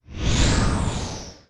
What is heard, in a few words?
Wipe